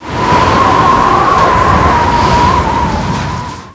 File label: tornado; kronos